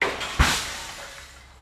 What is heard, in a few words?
Breaking Window